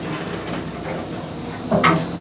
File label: turret; World of Tanks